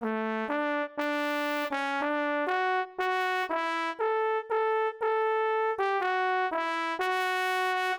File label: Bad MIDI